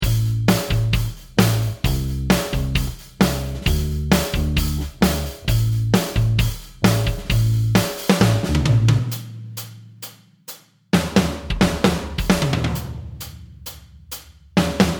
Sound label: drum bass